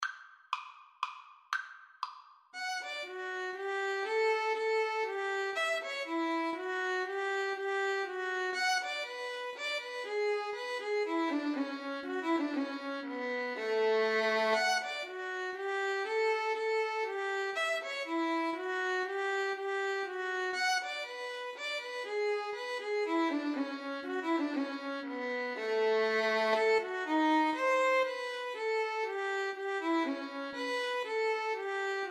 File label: minuet violin cello duet